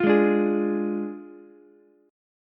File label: D Diminished D, Ukulele; strummed